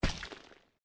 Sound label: chunk fall, gore; Soldier Of Fortune II Double Helix; XBOX